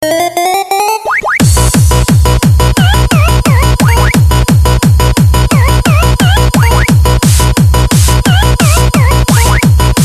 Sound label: techno; pacman